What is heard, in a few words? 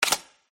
card slap